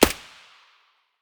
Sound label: sig550 silencer distant; weapons